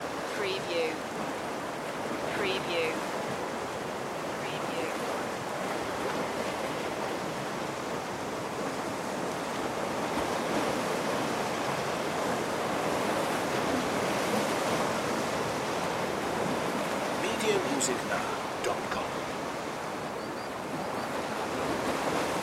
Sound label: sea ambience